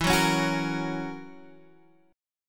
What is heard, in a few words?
Gb 7th E, chord